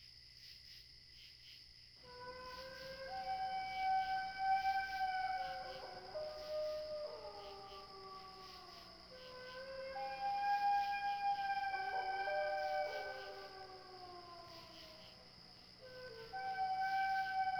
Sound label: Duet; Jazz